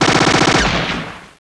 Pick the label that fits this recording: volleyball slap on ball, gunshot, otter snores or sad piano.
gunshot